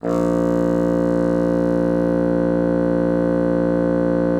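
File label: bassoon forte A#1; Xsample VOL-6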